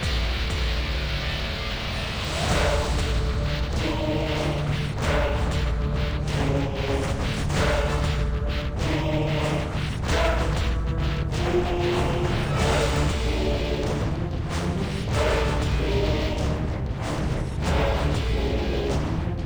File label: Combat loop; Epic of Kings